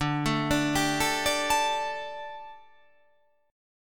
D Suspended 4th D

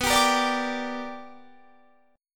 B Minor Major 7th Sharp 5th Guitar Standard; strummed; JGuitar

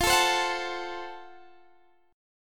Gb Minor Major 7th Flat 5th, chord

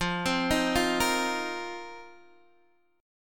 F 7th Suspended 4th, Guitar DADGAD

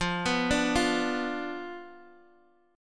B Diminished F, guitar

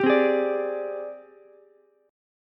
Ab Major Sharp 11th Ab down Ukulele C6; AbM#11 strummed; JGuitar

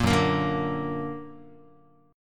Gb Augmented Gb Guitar; strummed; 4A,4E,5A,5D,5G#,6C#

